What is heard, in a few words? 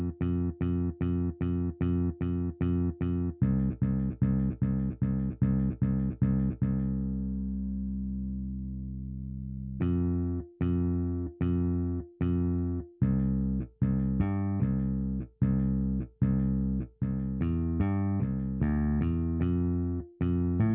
bass